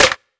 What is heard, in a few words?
Snare Roll Pattern; Snares